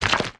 ice particles; factorio

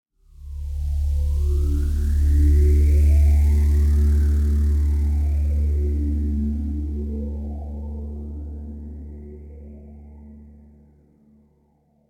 interlude, ambient; Factorio; gleba

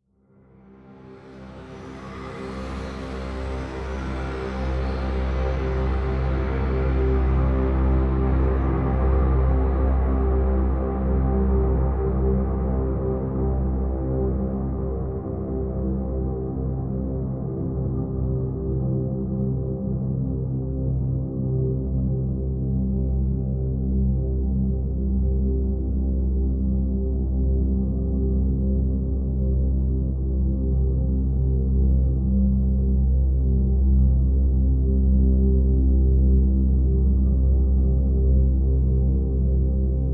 space, ambient; Factorio - Space Age

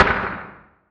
asteroid damage oxide small; Factorio

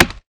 axe mining; factorio; hairyclubnub

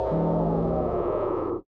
electromagnetic plant cooldown; Factorio

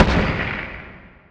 asteroid damage carbonic big; Factorio

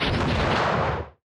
thruster engine activate; platform thruster; Factorio

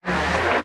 arm extend, asteroid collector; Factorio

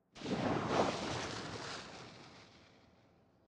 sand wind gust; factorio

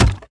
axe mining; factorio; stingfrond

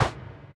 mech armor land sand; Factorio

